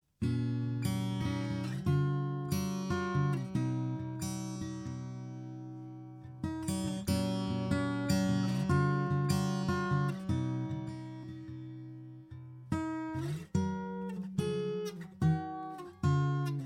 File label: 114ce fingerstyle; LTD